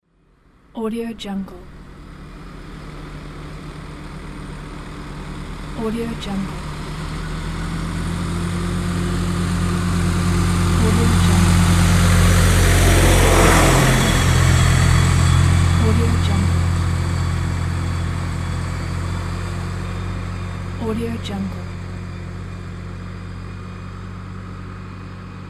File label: pickup truck passing by; sonicside